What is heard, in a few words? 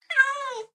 meow, cat; mob; Minecraft